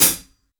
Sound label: Boss DR880 hihat; drums